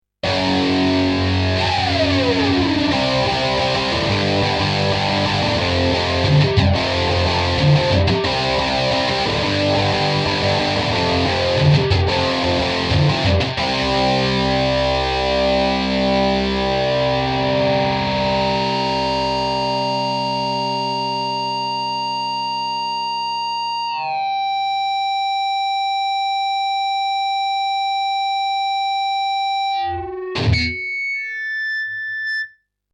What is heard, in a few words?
Pick Scrape Feedback, Midi Guitar